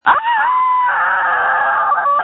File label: screams